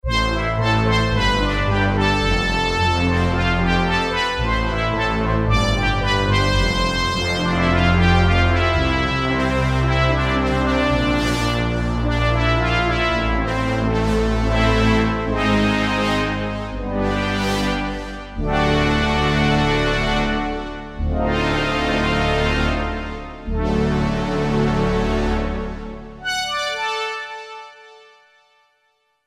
MATRIX PAD; Akai SG01v

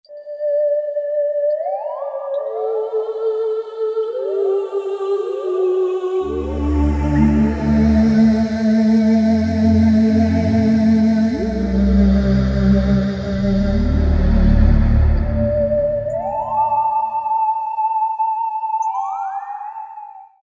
Magic Voice, Korg MSC-05S Piano

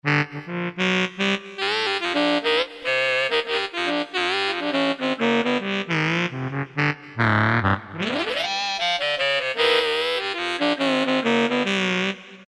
Hard Sax, Korg T3

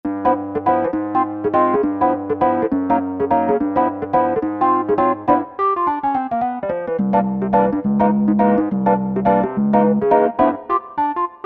60s Bros; Roland SRX-07 Ultimate Keys

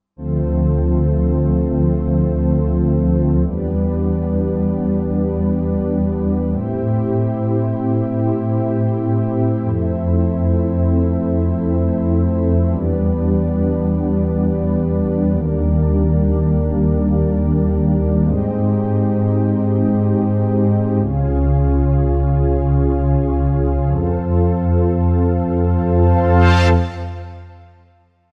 Juno-60 Pad, Roland SR-JV80-09; Roland SR-JV80-09 Session

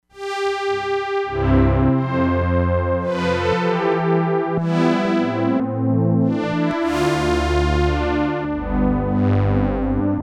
STRINGS, Crumar Bit 99; Factory Programs

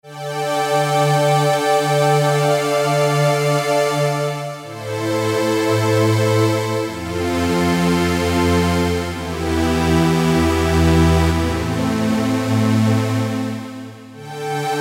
Saddest Strings; Green Oak Crystal

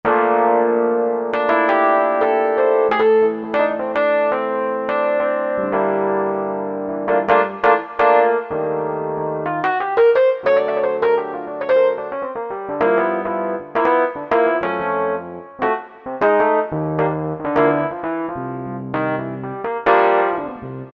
Hard E.P., Korg T3

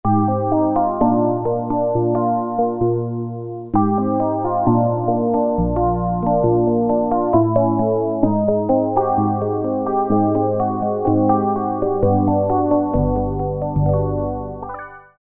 PianoPad, Roland MKS-50; Factory examples

